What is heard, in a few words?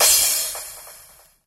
Crash; Cymbals; Vengeance Samples